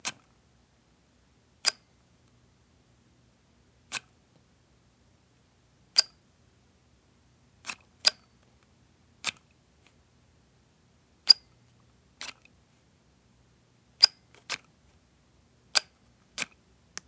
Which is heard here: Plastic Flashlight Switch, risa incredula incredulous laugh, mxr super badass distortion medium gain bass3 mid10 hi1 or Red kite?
Plastic Flashlight Switch